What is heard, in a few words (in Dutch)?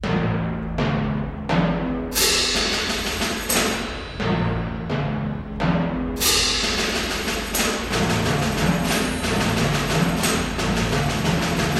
slagwerk